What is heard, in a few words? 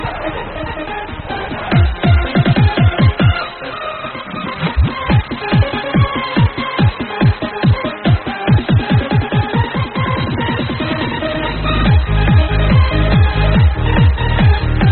Trance techno Mix